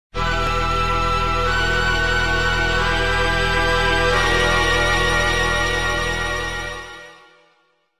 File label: Fanfare (Battle Lost); Conquest